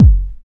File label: Soft Kick; Vengeance Samples